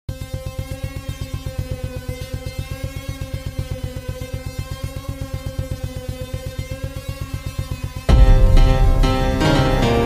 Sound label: keyboard cover; kgf chapter 1; kgf theme; rocking star yash